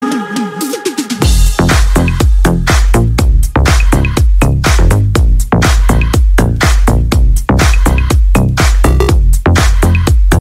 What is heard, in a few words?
electro ibiza ringtone